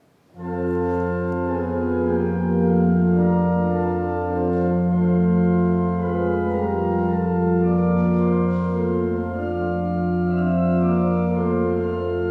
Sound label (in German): Orgelausgang